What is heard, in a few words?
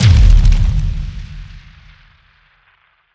crash